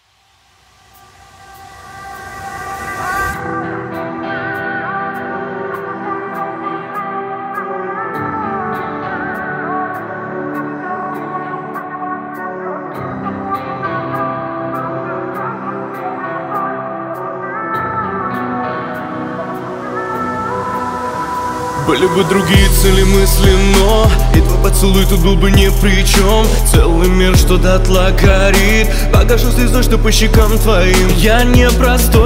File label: metal cover